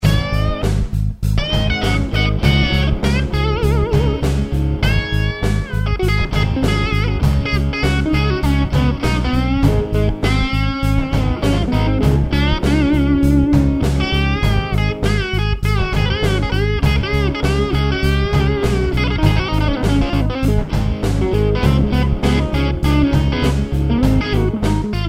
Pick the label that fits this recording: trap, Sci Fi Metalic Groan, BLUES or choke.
BLUES